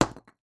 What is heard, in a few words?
masonry hit; teardown; ps5 windows xbox series xs